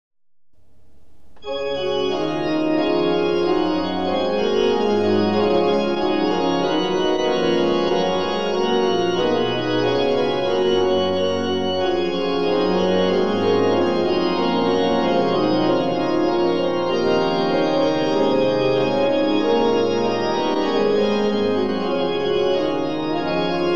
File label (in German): Choralvorspiel; Bach; Es ist das Heil uns kommen her